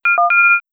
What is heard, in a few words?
Notify; Kerbal Space Program v1.0.5; KerbalAlarmClock